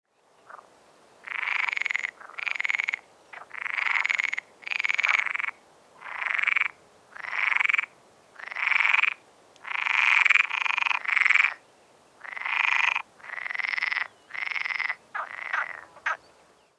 Frogs and toads croaking by the river

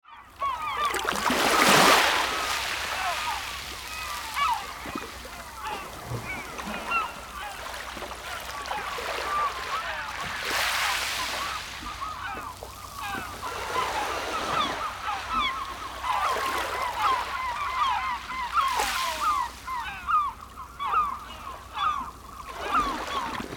Ocean breeze ambience with seagulls loop

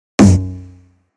E-Low single

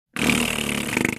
Raspberry mouth short blowing human

